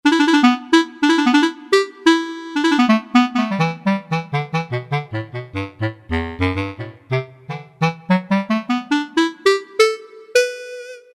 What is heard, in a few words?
BASS CL; Orchestral Winds; Roland SN-U110-06